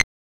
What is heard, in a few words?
Perc, Rattlesnake Parametric Percussion System; BME Rattlesnake Parametric-Percussion-System